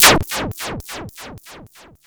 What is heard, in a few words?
ECHO FX 2, ANALOG ECHO; Zero-G Ambient 1